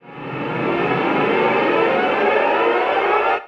NOISE, Production Effects

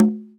Conga; Equipped Music; Loungin House

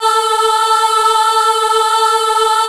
voi voice syn; Ueberschall; Techno Trance Essentials

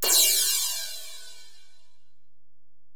Ride, Alesis DM-PRO

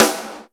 RIM-SNR; Rubber Chicken Software Sample Bank; DRUMS + PERC

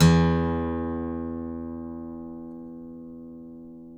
ACGT E2, ACCGTR PICK

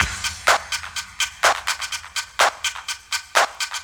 DRM, LOOPS 125, House; Best Service ProSamples vol.10 - House; AKAI

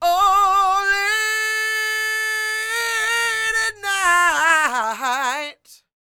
SING, Vocal Planet Blues Soul BLUESY; Spectrasonics

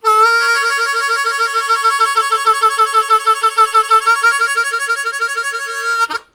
High Roller, Harmonica Riffs; Zero G

